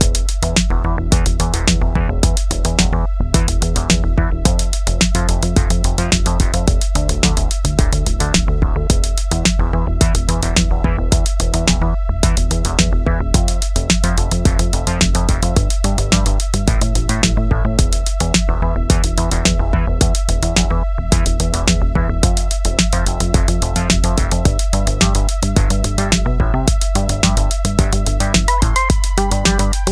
Electro

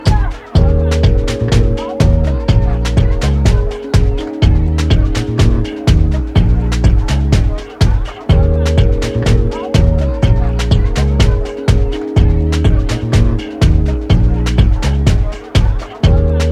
Instrumental

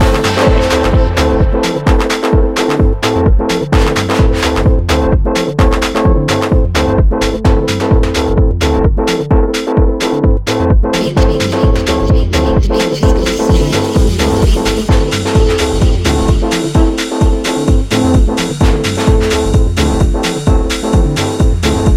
Organ

